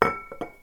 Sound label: putting a bottle